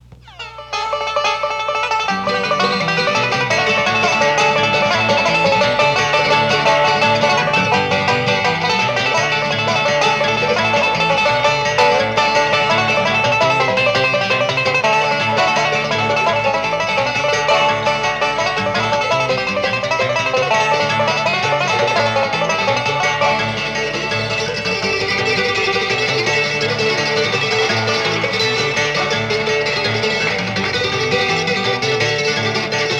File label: Instrumental; Oceanside,NY